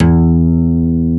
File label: JV80 guitar E2; East West - Global Sounds